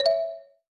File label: Button; UI